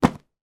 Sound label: household book hardback place down; zapsplat